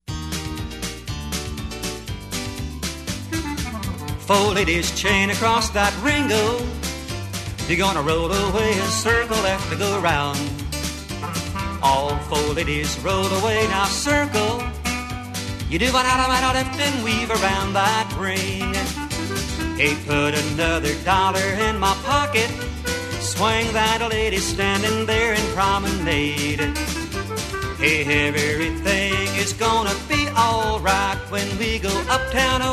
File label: vocal